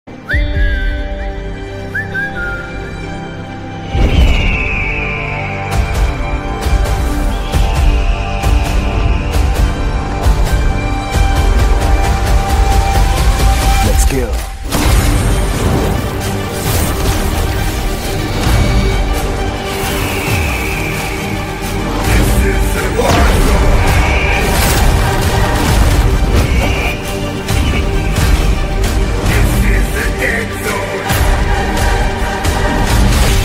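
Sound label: teaser trailer background music and ringtone; eagle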